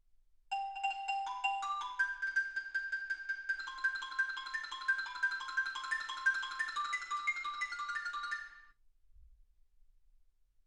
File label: xylophone